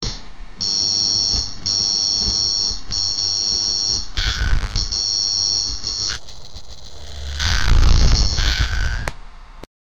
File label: hacking sound you are hac, alert